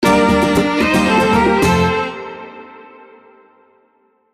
logo orch

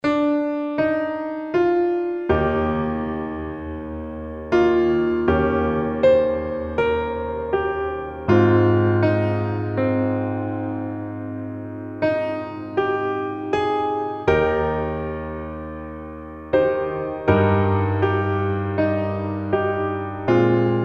piano; Danny Boy

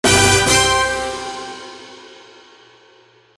jingle

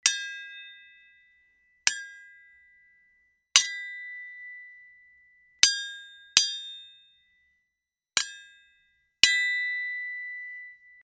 sword

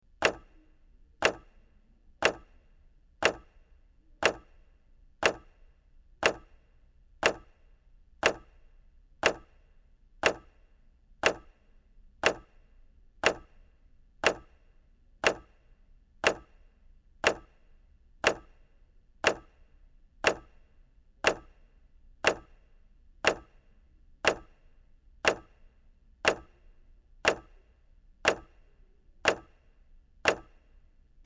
clock

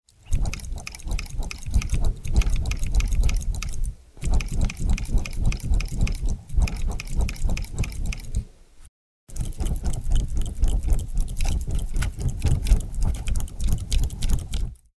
uchiwa

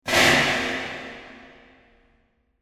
heavy metal hit

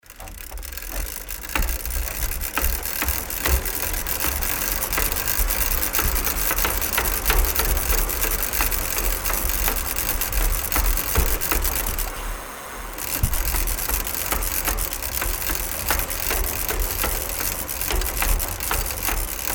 bicycle pedal fast

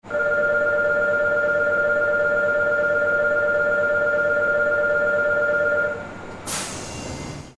subway departure bell

